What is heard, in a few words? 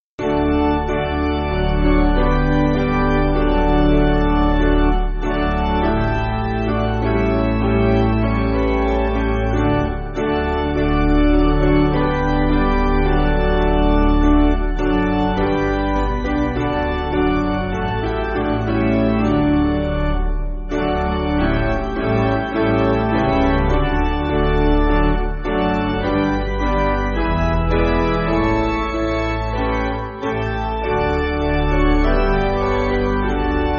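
Piano, Music Backing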